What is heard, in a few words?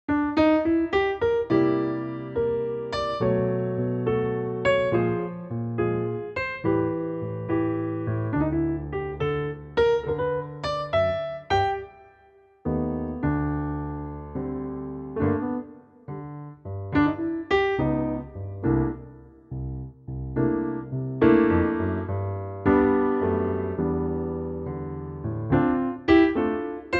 G piano